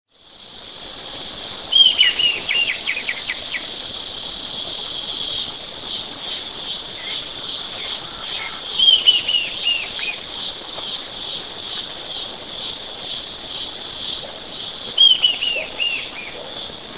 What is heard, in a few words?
Pachyramphus castaneus Caneleiro